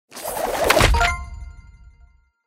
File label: paper you won; Hot Off The Press